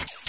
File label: utopia minimize, windows 9x sound schemes